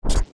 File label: Steps Carpet Jump; zelda; OOT